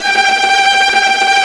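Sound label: TREMOLO STRINGS G1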